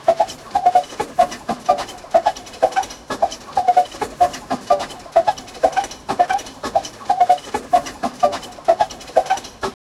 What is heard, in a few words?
continuous vintage steam, locomotive